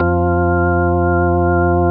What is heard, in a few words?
HOUSE ORG F3, DANCE ORG; Best Service; Advanced Media Trax Modern Composer